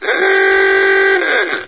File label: funny horn; 暴力赛车